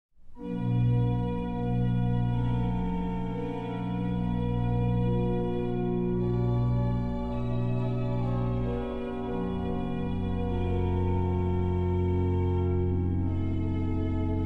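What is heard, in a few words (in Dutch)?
Psalm, orgel; Nijmegen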